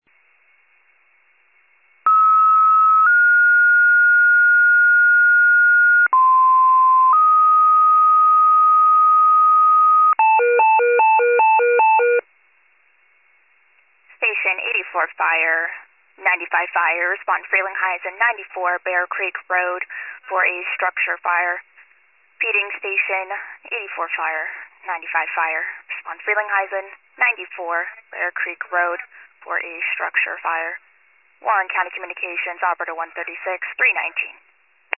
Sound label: FIRE pager alert